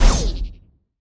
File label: close combat hit hard; CrossCode; battle